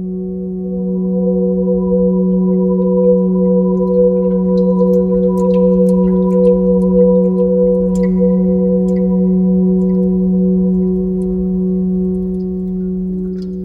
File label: ambience